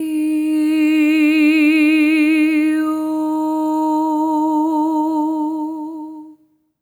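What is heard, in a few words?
soprano eh oh D#4; Spectrasonics; Symphony of Voices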